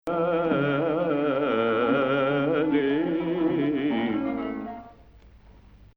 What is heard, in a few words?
Hijaz